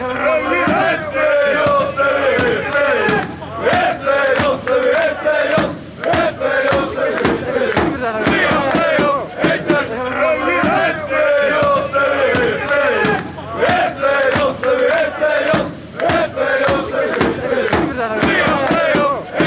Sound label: we hate play offs